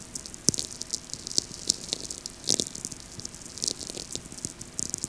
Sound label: VLF; StMichel